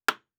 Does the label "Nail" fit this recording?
Yes